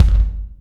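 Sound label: bass drum, right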